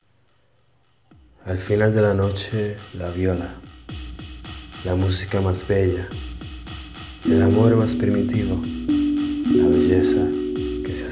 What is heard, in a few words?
Viola Kalimba; softmartin